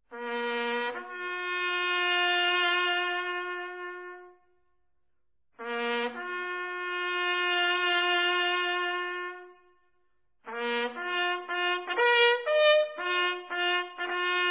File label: Last Post (Tattoo), bugle calls